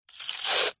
hospital syringe squirt gel medicine into plastic container